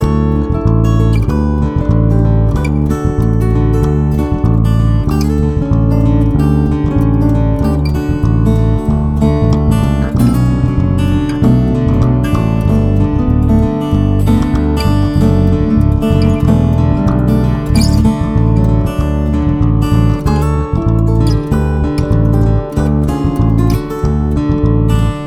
Middle Part Without Drums, Happy Guitar Instrumentals